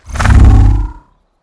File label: barrier quit; cstrike; Valorant